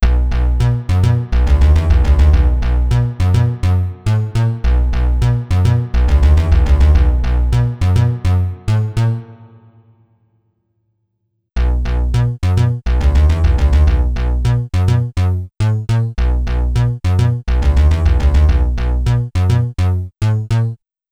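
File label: bass synth wet dry; dsk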